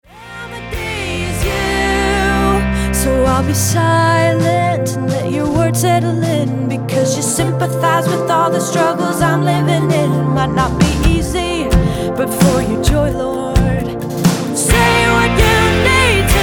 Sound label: DRUM BOUNCE